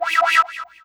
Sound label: Synth Hit E